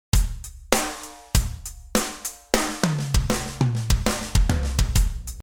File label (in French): fill avancé